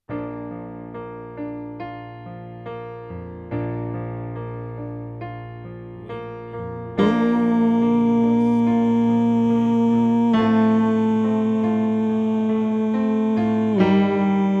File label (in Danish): Tenor; oevefiler